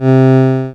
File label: Casio MT-45 Accordion C3